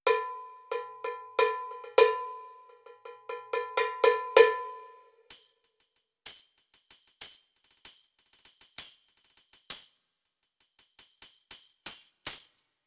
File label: cowbell tambourine